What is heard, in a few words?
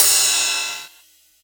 Rides, Cymbals